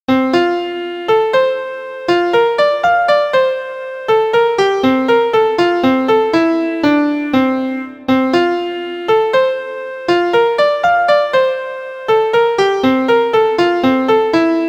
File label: MIDI